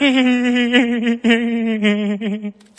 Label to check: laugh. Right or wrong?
Right